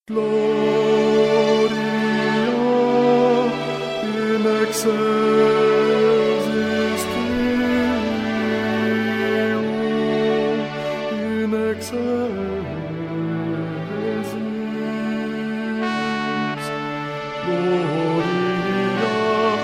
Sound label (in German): Motette Tenor